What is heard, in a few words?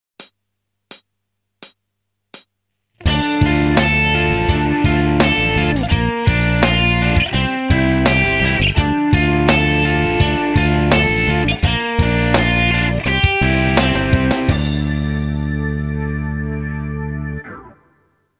Classic Rock Arp